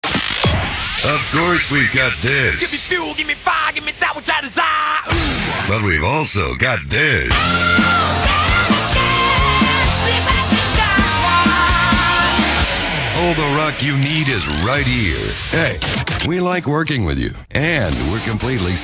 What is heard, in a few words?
Rock